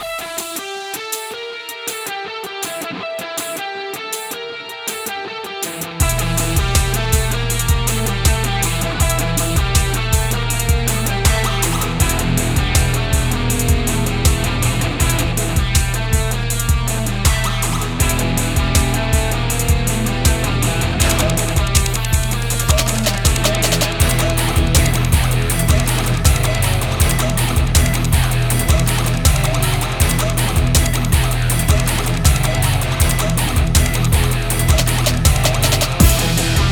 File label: Electronic Rock Theme